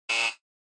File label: Electromagnetic Buzzer, Dry